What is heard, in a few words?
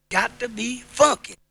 Vocal; ZERO G - Datafile DISC 2